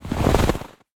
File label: snow footsteps walk light; Bluezone Corporation